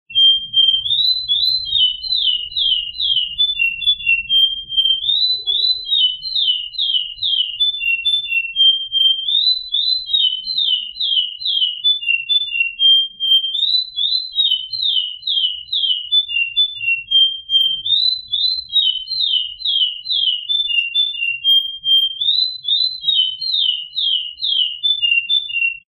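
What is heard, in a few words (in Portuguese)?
goiana clássico, Pernambuco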